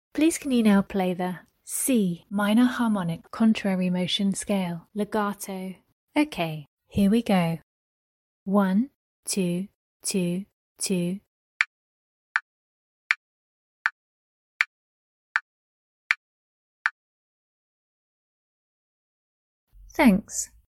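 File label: C minor harmonic contrary motion scale legato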